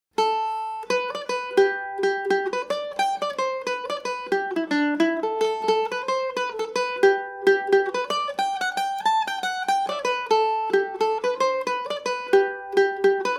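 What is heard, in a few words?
jig G major, Irish mandolin; dermot byrnes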